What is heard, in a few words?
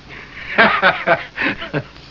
laugh; lorrelibrary; stroheim